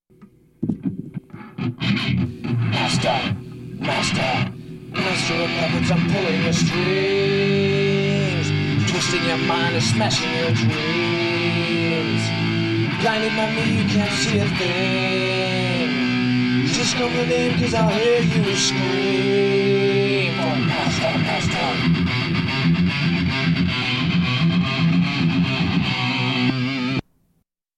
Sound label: Riff Tapes